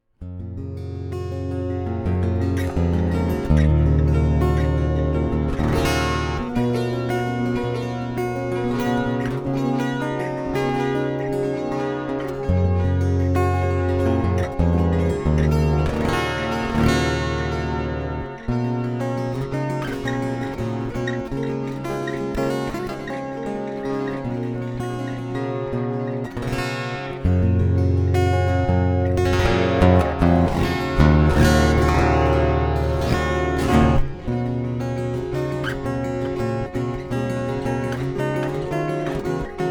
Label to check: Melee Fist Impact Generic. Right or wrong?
Wrong